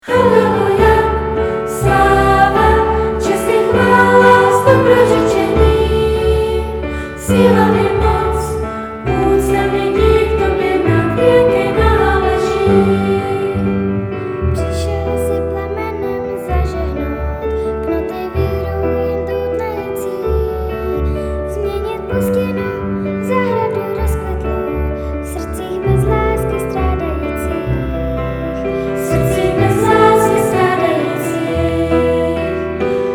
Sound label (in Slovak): Haleluja